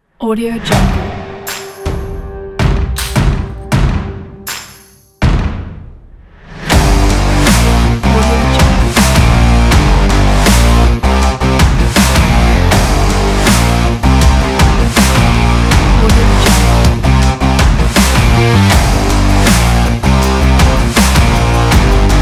motivating hard dirty rock

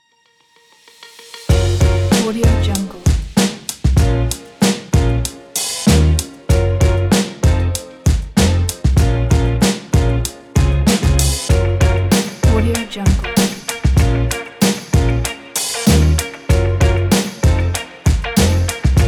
rb vintage tape

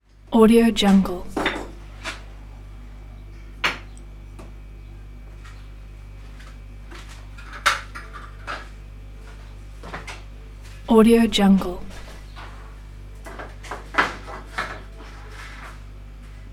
construction room ambiance; crisstyna

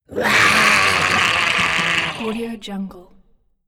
female zombie roar

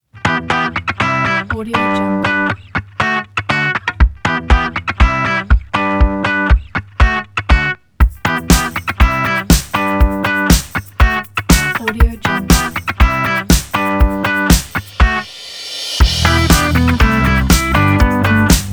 indie pop

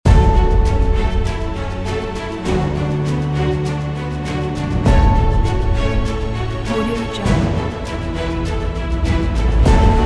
inspiring cinematic logo

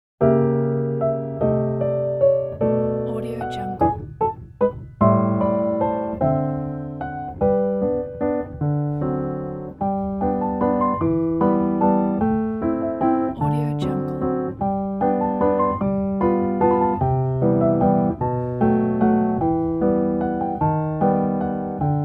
jazz piano waltz

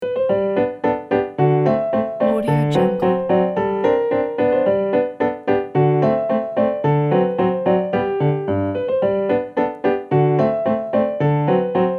beethoven ecossaise

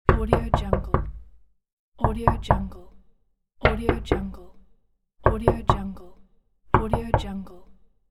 knocking on wooden door; sviridpavlov

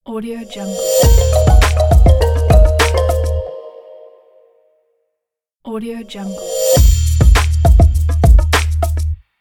audio logo intro

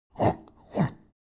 animal dog drink snort; smartsoundfx

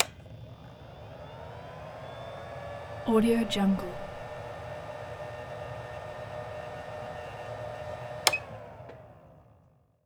light run; prosoundfx

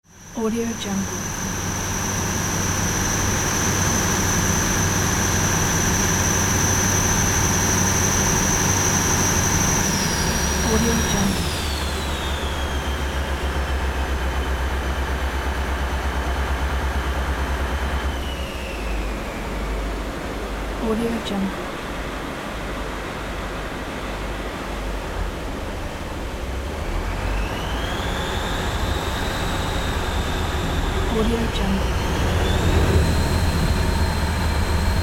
ferryboat engine entering the dock

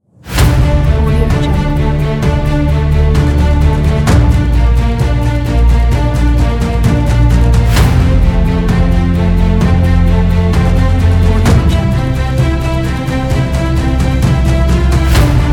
epic trailer

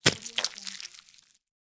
flesh gut drop spill

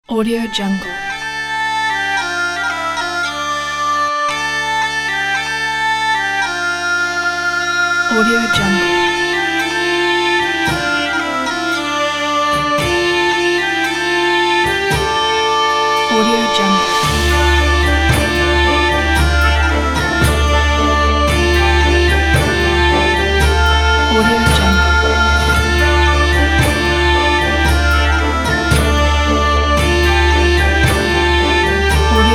european ethnic

